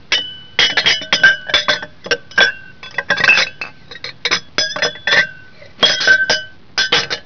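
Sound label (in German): Gläser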